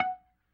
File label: F#3 PRC, B3 PERC; Big Fish Audio - First Call Vintage Keyboards